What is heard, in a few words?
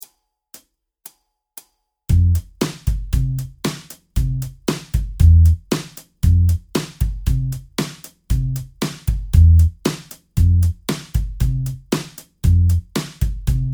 Cabaret Tempo 116